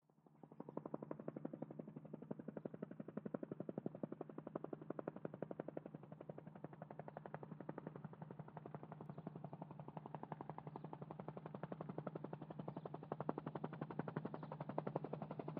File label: HELICOPTER BELL 206: EXT: Approach, landing, id